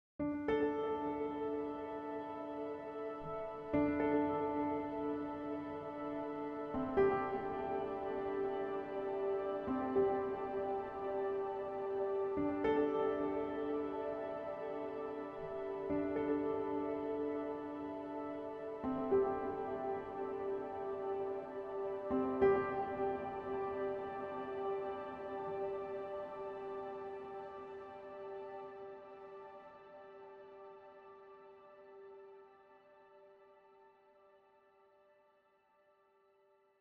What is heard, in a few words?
Textures Cinematic Harmony